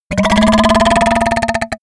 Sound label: goblin fart, comedy cartoon; soundpool